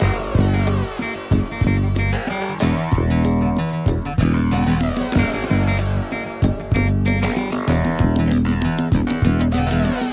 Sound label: guitar, Loops, Phonky